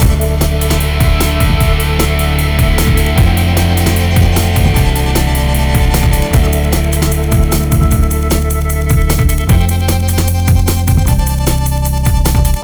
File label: Hardcore Breakbeat, 152 Dmin; Big Fish Audio; Hardcore Breakbeat Action